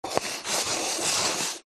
household cup plastic empty slide upside down on table magic trick; zapsplat